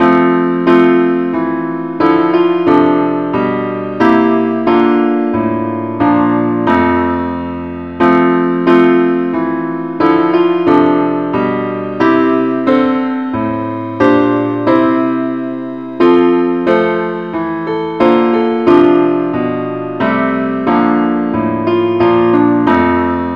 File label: parts only key f